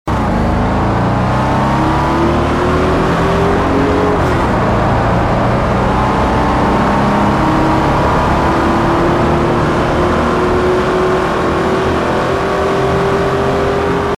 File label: second, vehicles; TDMCarpack; TDMCars